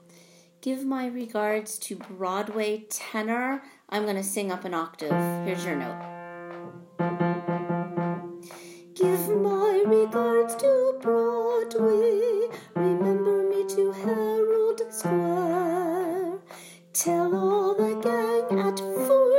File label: Tenor; Broadway Shabbat